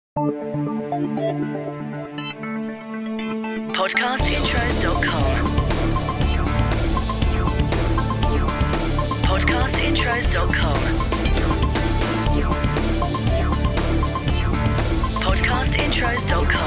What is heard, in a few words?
CORPORATE Sphere; Podcast Watermark